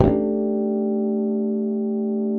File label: FRTLSS, Bass; Sampleheads; Will Lee Bass Library; F JZZ